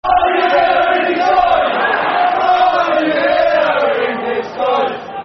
Are You Villa In Disguise, Football Chants; West Bromwich Albion Wba; fanchants

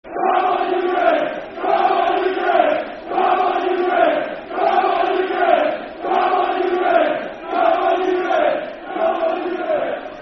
Football Chant (Soccer Song); Come On You Reds; Aberdeen Afc

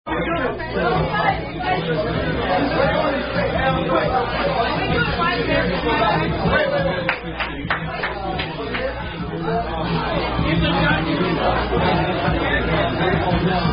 Drinking Game; French Vs American Mexicans Drinking Game

